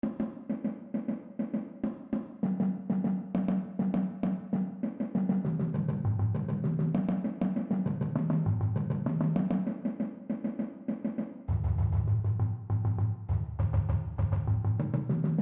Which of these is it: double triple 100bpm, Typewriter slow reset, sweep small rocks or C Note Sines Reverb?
double triple 100bpm